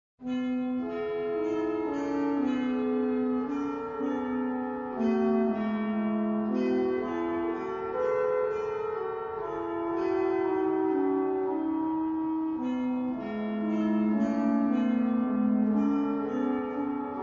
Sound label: bell; holsworthy